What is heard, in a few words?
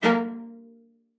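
CELLO spiccato A#3; Xsample VOL-5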